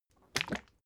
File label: bottle syrup upend glug; berufe; gastronomie